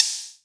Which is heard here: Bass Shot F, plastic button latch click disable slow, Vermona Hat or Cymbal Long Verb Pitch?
Vermona Hat